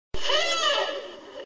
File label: Elephant voice trumpeting